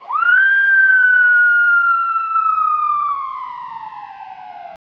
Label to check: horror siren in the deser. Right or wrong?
Right